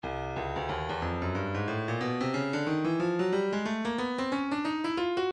breakbeat 091 bpm; Stylus

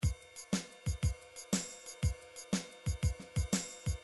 midi drums

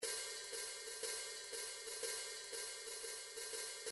swing cymbals 2 bar, midi

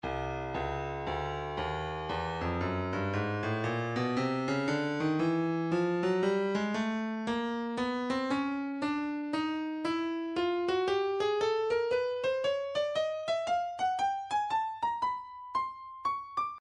breakbeat midi files 050 059 bpm; Stylus; 058 Wallfly D

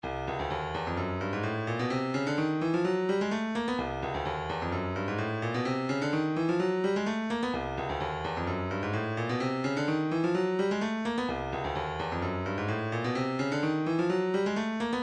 shaker, midi; 128 Keep Movin

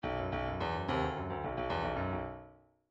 bdf midi file grooves funk k funk k

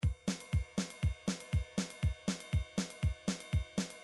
midi drums ethnic

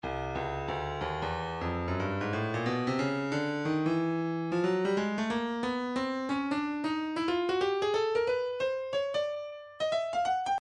stylus breakbeat midi 091 bpm; Urbadub

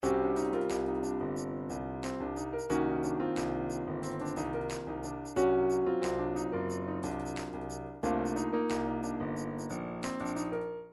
triphop; Newtronic Triphop Construction Kit

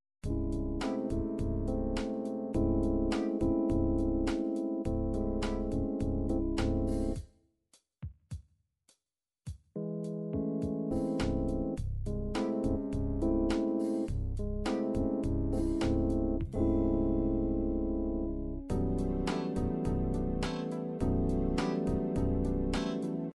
8 bit 8beat ballad, midi

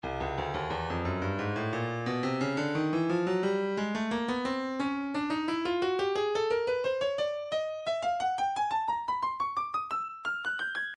breakbeat 088 bpm; barrio b; Stylus Breakbeat Midi Files

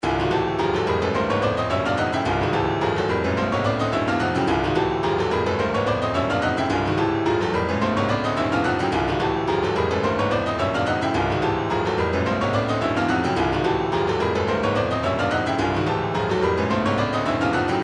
retro funk 108; boomaloo

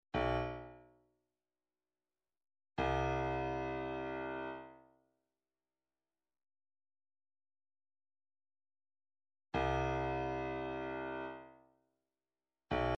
142 Doumbek, midi

